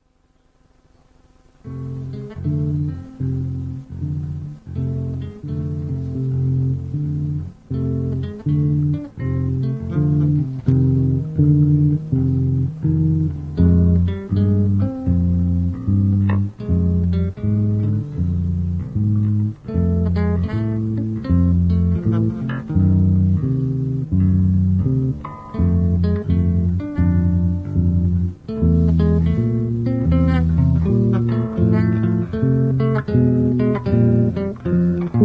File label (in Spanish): trio de bajos